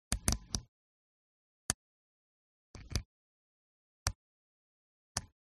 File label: Walkie Talkie Kenwood TK 3107 FM Button Switch; lesser vibes